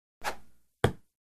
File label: Darts; Dart Throw With Feather Whoosh. Hits Target.